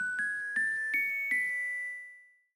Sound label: jingle gift box open remainder